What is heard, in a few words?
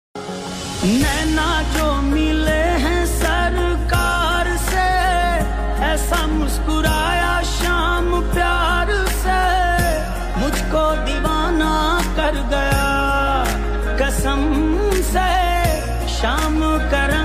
bhakti ringtone